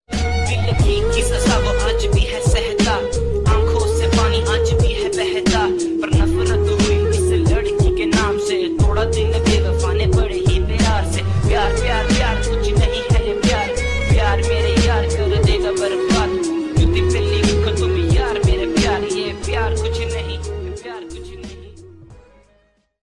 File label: sad cool music ringtone